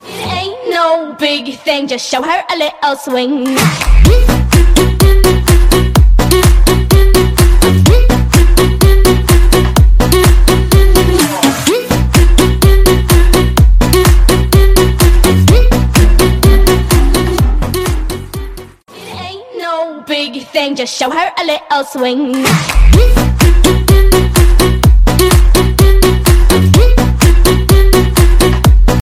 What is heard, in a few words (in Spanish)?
tonos tono de llamada